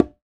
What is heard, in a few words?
QUINTO; NorthStar - Global Instruments VOL-2; Pop Rock Set